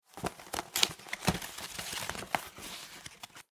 Food Cereal Box Open; Household

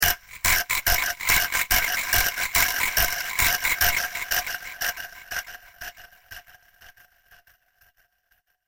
Arcade Game Clockwork Termites; Video Game Sound Effects; Retro Inspired Sound Effects - Think Nintendo, Atari, C64

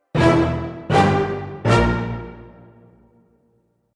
Action Film Dramatic Event, musical

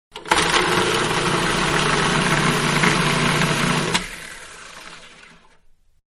Food Processor-Long Blast; Food Prep; Restaurants; Manhattan Production Music

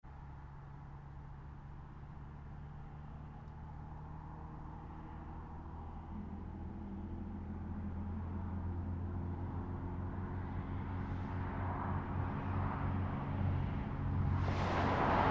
Traffic Country Road 2 Lane Med Far; Traffic and Cars; Big Room Sound

